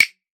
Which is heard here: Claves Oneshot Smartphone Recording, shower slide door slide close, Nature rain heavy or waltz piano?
Claves Oneshot Smartphone Recording